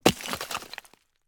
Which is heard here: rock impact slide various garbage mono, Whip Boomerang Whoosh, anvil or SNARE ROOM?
rock impact slide various garbage mono